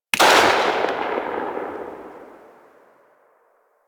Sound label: Lever Action Rifle Tail, Weapons; Warframe; Tenno